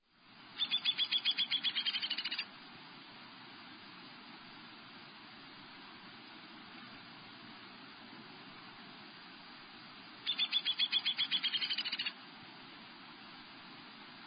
Downy Woodpecker

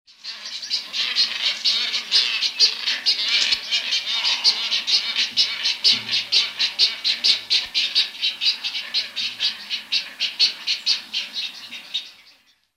Bird Songs Glossy Ibis

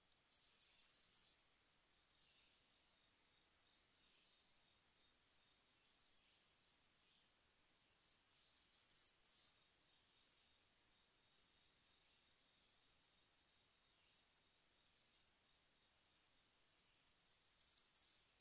Black-thoated Green Warbler

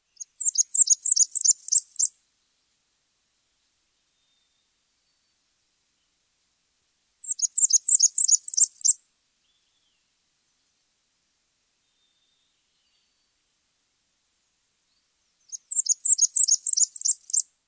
Blackburnian

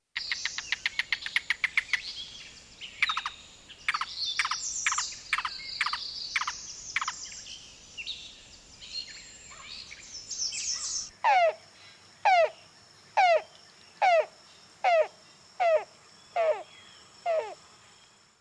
Yellow billed Cuckoo

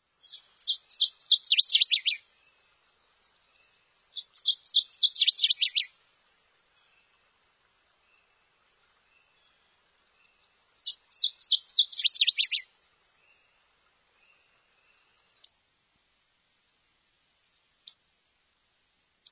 Northern Waterthrush

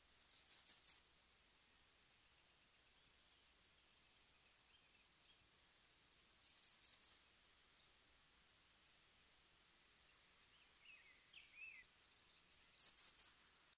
Cape May Warbler

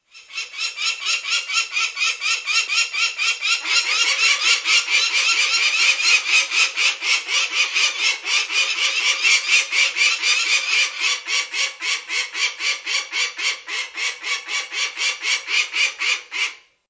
Peregrine Falcon

